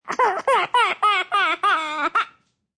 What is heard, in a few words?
Baby Crying